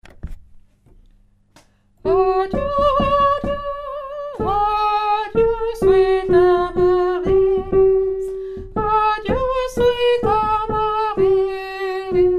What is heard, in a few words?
sweet amarillis soprano